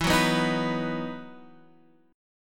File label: E Major 7th Suspended 2nd; EM7sus2 Chord {X 7 4 4 4 7} strummed